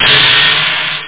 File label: Open Hat; SR-16; drums